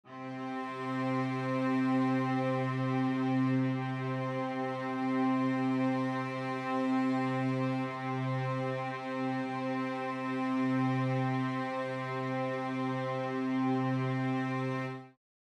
Octave in C, String Ensemble

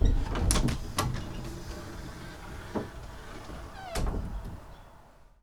door open

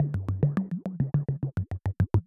808 Loop Toms 105, Roland 808 Drum Loops, 105bpm

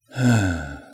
sigh

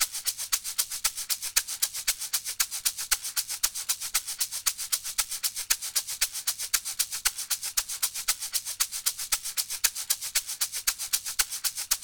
percussion shaker

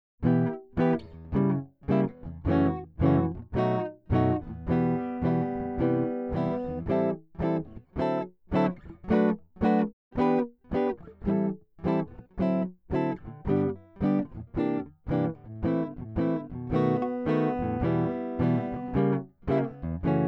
Guitar

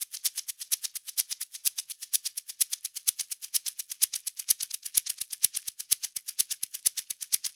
percussion shaker

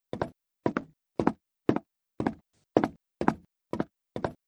Footsteps clear on wood floor

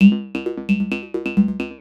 808 Loop Perc 132, Roland 808 Drum Loops, 132bpm

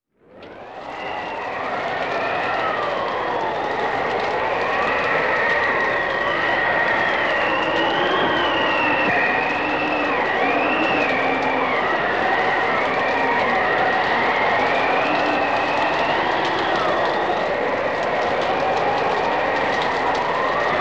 wind and rain

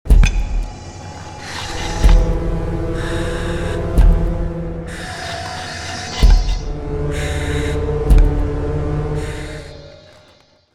A Three Legged Walking Machine; 384 Sound Designs

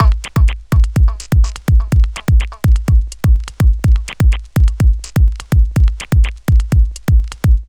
Club Dance Beat

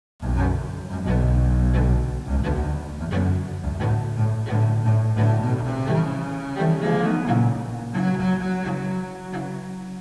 Classical Romantic, Music classical moody

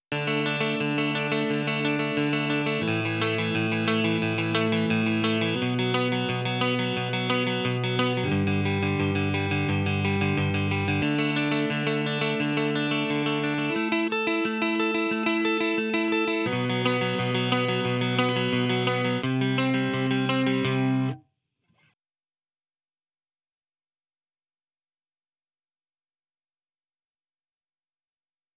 Lead Clean Electric

